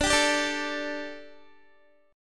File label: D Suspended 4th D, Guitar; Dsus4; strummed